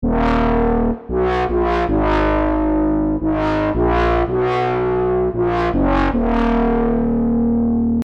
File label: Bass; Wombass